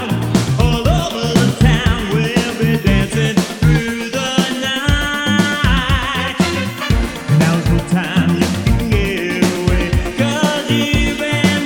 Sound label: Party Night Remixed